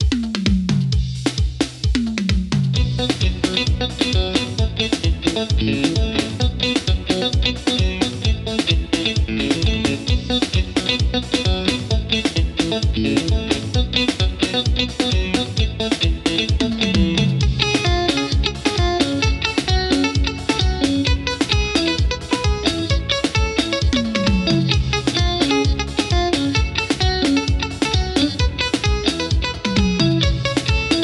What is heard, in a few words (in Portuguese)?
guitarrada teclados